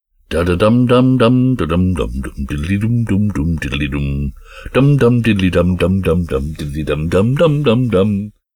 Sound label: male Song